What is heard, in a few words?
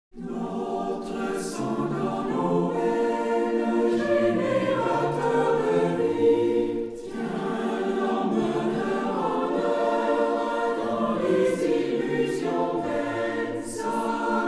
Hymn Saturday before Easter; Arche Musica